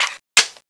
clip in; tfa cso; uzi

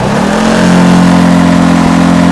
revdown; dukes; gta5

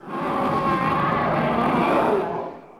dragon voice

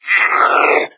chatter combat, hazmat zombie; doom3

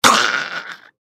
feral ghoul death; npc

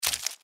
mantis foot; npc

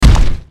foot hard; gwyn

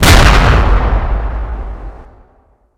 splode close

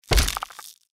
mirelurk foot; npc

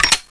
clip in; tfa cso

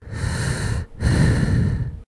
gas mask; clothing system

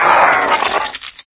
die, revanent; doom3

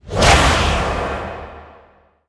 worm whoosh

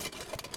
armor

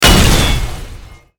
hammer hit, impact

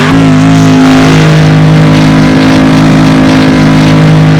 simulated vehicles revdown

